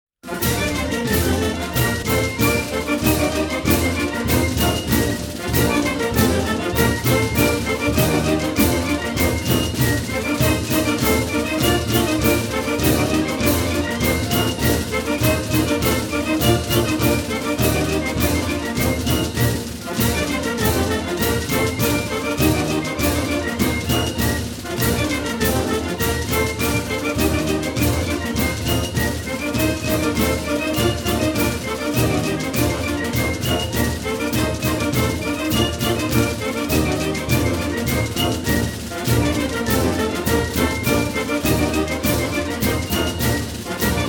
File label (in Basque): azeri dantza